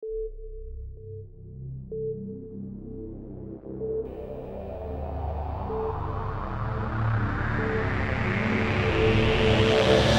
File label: Dramatic Logo Intro